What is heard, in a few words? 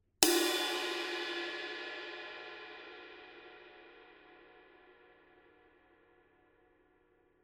masters 20 extra thin body, paiste